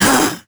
melee; Crypt of the NecroDancer

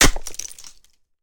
axe mining ore; Factorio

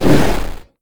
dragon hit; Crypt of the NecroDancer

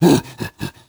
king conga attack; Crypt of the NecroDancer

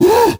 kingconga hit; Crypt of the NecroDancer

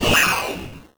wight cry; Crypt of the NecroDancer

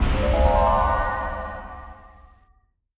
secret found; Crypt of the NecroDancer

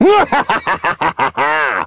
dorian laugh; Crypt of the NecroDancer

